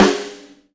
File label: boomy snare g sharp key, steel snare drum sound tuned to the G# note